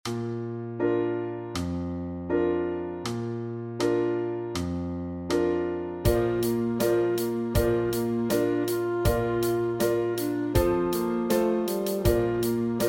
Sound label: Sax Study